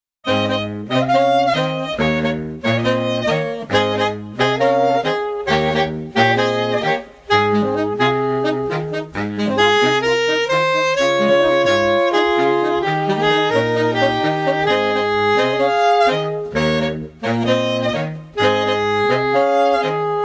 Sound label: jazz band, Saxophonist; oxfordshire